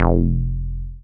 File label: 303, TB-303 BASS